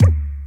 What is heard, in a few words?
low mid reggae tom single hit d key